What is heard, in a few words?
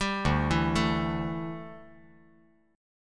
D Suspended 2nd Suspended 4th D down